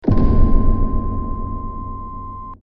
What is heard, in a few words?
door